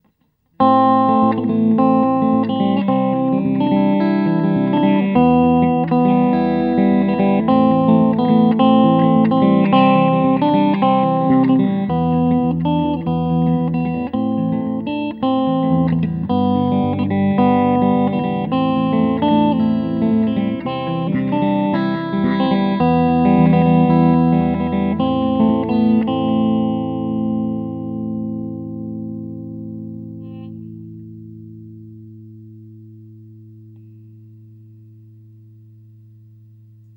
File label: Clean